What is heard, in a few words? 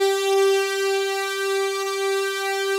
FAT P-10 G4, PROPHET 10; Greytsounds VOL-1